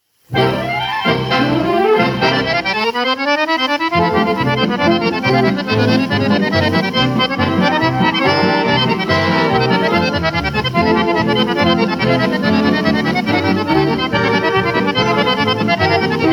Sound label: polka